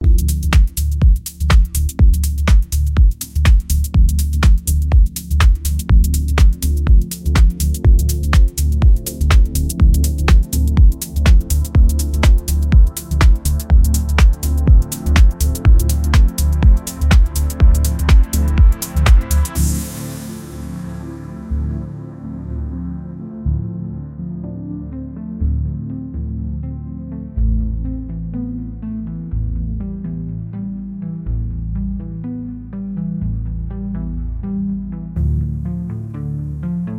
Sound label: moonlight groove